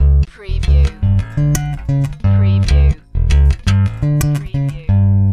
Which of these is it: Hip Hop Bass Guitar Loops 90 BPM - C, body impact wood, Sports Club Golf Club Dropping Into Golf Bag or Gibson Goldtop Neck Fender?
Hip Hop Bass Guitar Loops 90 BPM - C